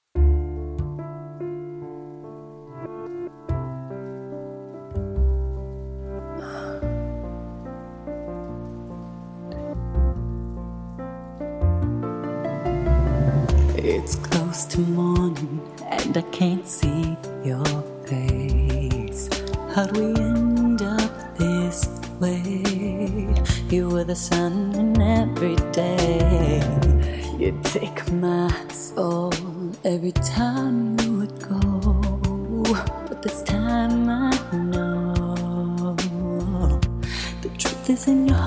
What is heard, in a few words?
Ballad